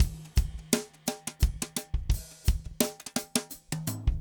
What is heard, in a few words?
funk 6 4 rods 170; odd loops